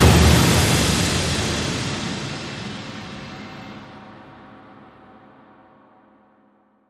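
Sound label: hit ambient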